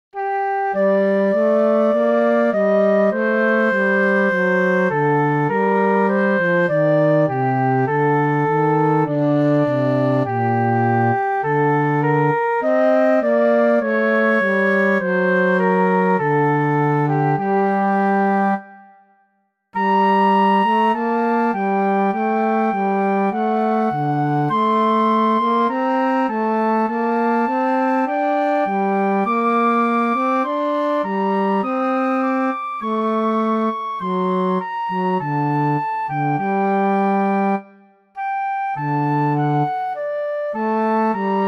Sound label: Flute Cello, instrumental; I am using the flute voice (playing down low) for the cello.